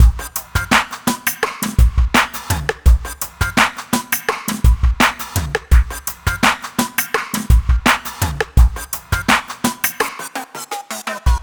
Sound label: Drum Loop, Drum Loops; Sonic Foundry (Sony Creative Software); Leo Cavallo Bass Taster